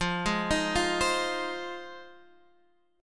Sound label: Ab 6th F